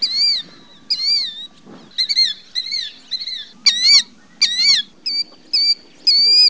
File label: killdeer